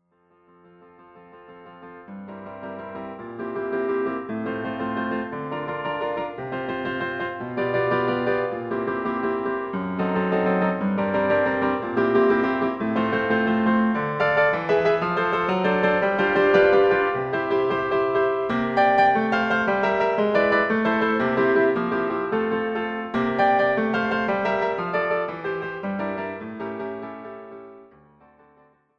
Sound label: chant d'amour F maj, piano accompaniment